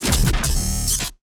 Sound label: Sci-Fi Mechanism; Sci-Fi Weapons-Source Sounds; Robobeat